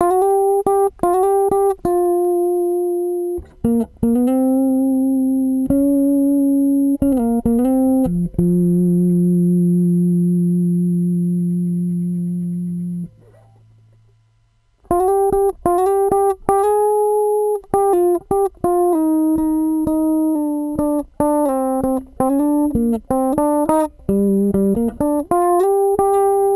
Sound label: bitcrusher